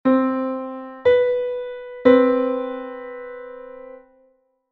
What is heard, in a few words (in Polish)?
septyma c1 h1; konsonans i dysonans